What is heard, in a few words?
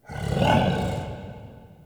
Interior Light Burps; Ambience; Indoor; Warframe; Infested Eris